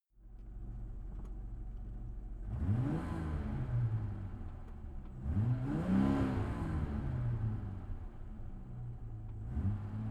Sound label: car jaguar e-type rev interior